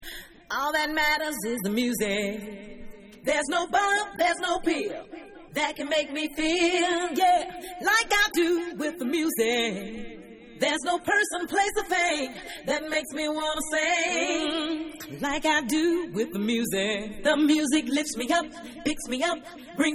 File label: Acapella